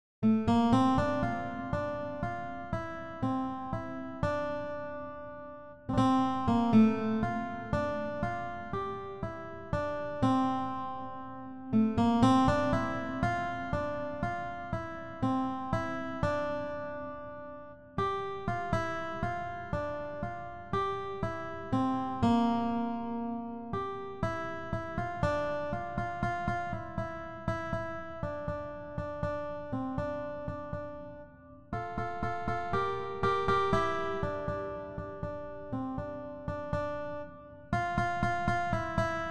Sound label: guitar